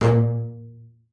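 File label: marcato A#2 left, bass spiccato; Xsample VOL-5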